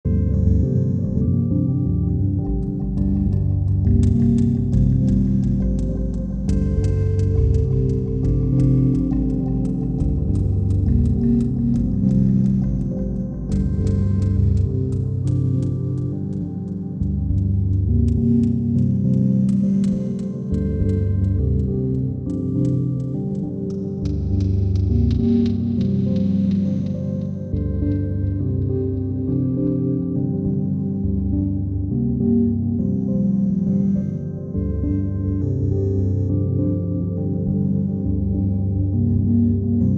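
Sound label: SubH/DFAM/Mimeophon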